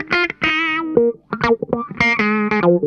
NOTE, Funk Guitar; Zero G - Funk Guitar

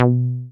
SYN 303, Roland TB-303

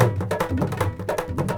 INDIA L, Heart of Asia, IND ENS

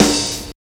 SNARE 2; East West - Phat & Phunky CD2; 90's Sample CDs

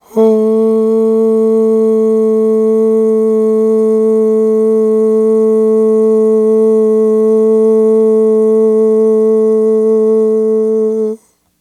AHHH, Vocal; Polyend

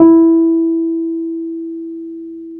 PNO, Wurly

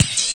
RSS, 160 BPM

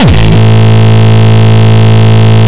Longkick, kicks

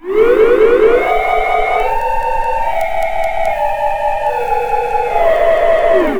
SPACE ALARM; Big Beat Samples & More; AKAI; ALARM KIT

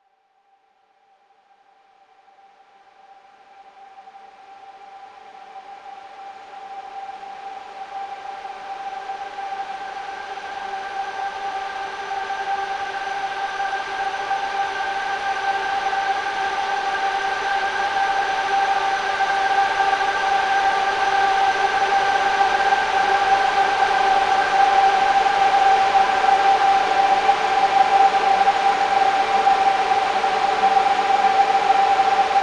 Lush Pad G

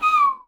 bird call; Voice Crystal - Steve Reid Definitive Percussion

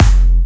Jump Kicks, Bassdrums

